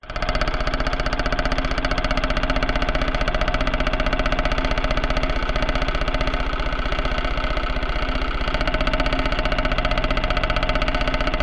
industrial engine motor generator or similar vibrating clunks